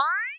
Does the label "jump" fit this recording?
Yes